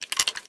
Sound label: reload mvt; Valorant; sherrif